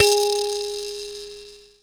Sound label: THAMB PIANO, AFRICA